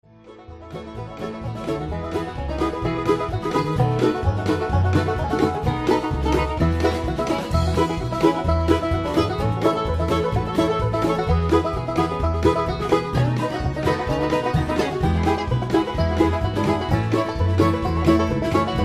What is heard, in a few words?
Instrumental with No Melody